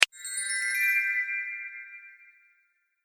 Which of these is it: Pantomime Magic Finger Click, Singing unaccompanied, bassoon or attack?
Pantomime Magic Finger Click